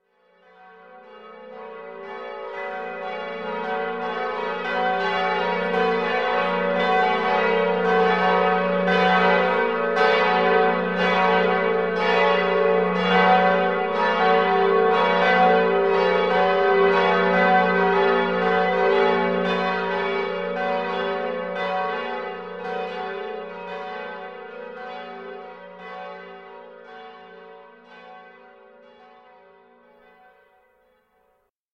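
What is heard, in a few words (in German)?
Glockengeläut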